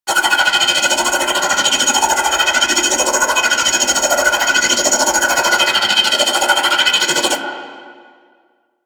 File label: Glaive Loop; Enemies; Weapons; Warframe; Teshin; DE